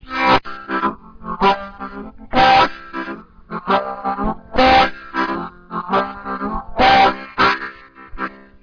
Chug

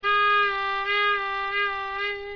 Bad Draw Bend, diatonic

